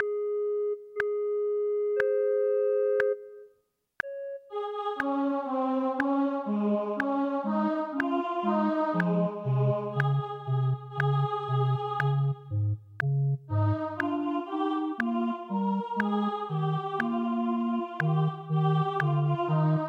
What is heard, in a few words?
O clap your hands; Gibbons